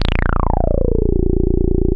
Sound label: Boom da Bass A#1; E-MU Producer Series Vol. 6 – Analogia Project (CD 1)